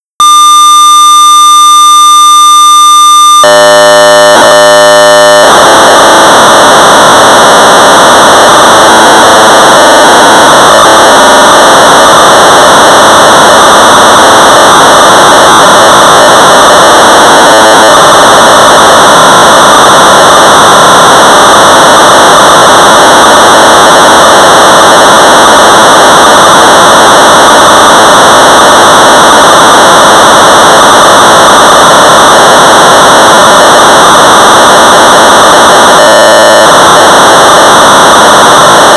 Sorcerer 1200 baud; Interceptor; Blair Rideout; Scramble clone

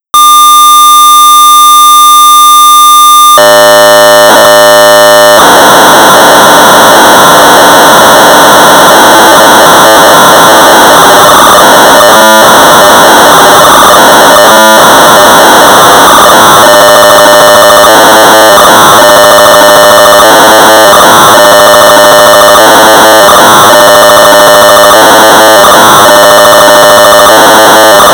Sorcerer 1200baud; Defender 3.2; 1982; J.Forester-N.Leith; System Software

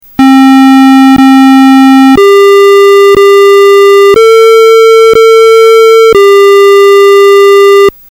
c4p sound output

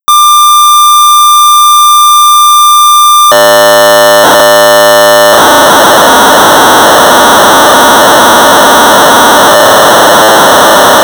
Sorcerer 1200 baud; Kaleidoscope; BASIC

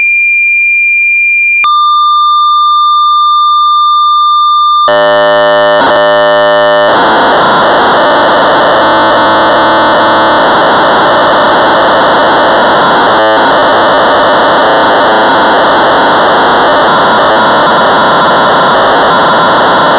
Sorcerer 1200 baud; Hunt-the-Wumpus; Sorcerer version by Duncan Craven; BASIC

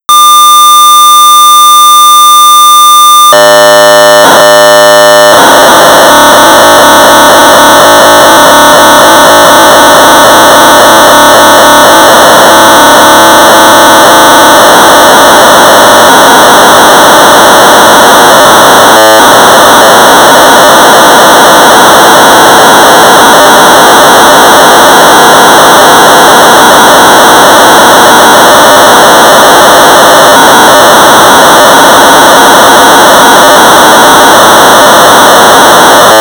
Sorcerer 1200 baud; Snake; BASIC

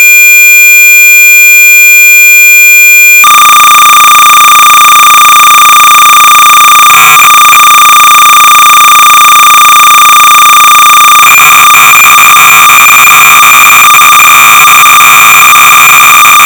Sorcerer 300 baud; Air Traffic Control; D.Mannering

